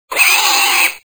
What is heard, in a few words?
pig squeal